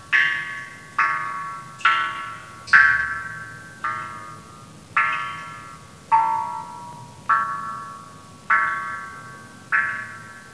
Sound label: suikinkutsu